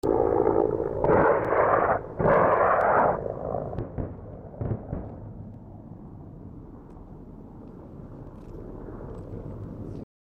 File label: mi35 rocket